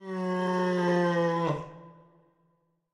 Voice Hurt; BatIM; Searchers

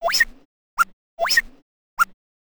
SNOWMAN IDLE; DTLProto4

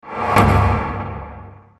menu enter

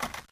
Open Inventory; MLSS; Japan

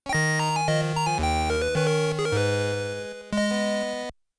Game Over; Millipede

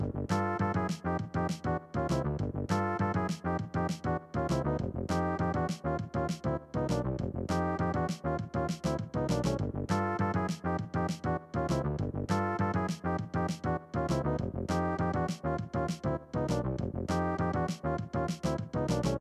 unused song; Warios Woods; SNES

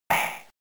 bomb step on; WarioWare Inc; Mario2

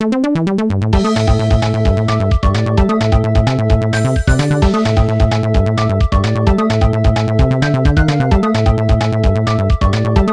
Unused Fast Paced Music; Ghost Trick

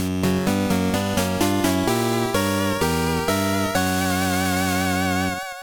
Placeholder Sting

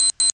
Beep; Blast Chamber PSX; Demo Interactive CD

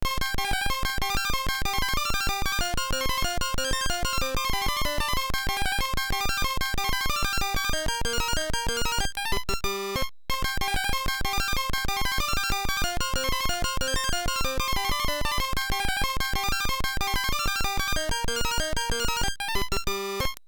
Unused Song; Tetris DX

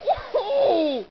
Pain; KKND

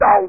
Player Voice Jump; Blast Chamber PSX

